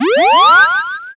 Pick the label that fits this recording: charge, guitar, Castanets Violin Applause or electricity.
charge